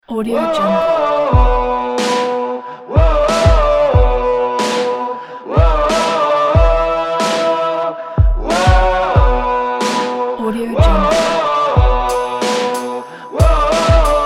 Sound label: powerful indie rock